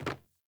Sandals Land Wood, Footsteps; Characters; Player

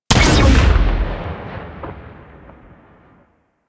Sniper Rifle Alt Shot; Warframe; Weapons; Corpus

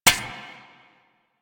bird three single step; Ambience

